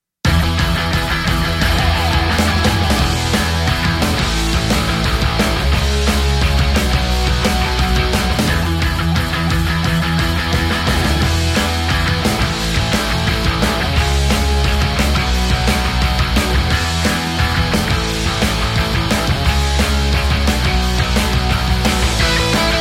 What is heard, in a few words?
Pop Punk, RINGTONE; MIUI12